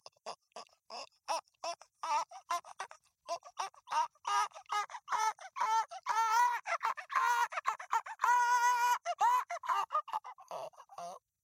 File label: Baby cry